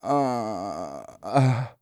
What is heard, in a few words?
groan; Singles; French Version